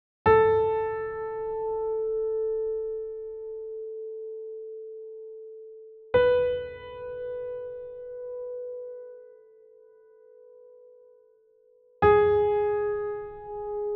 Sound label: Piano